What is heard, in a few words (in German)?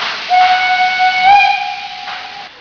Pfeife